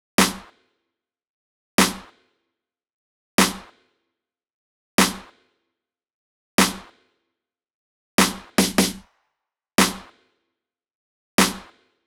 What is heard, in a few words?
Snare, 075 Bmin, Drum Tracks